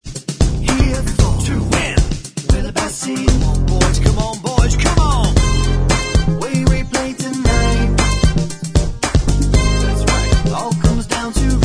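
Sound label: instrumental, backing track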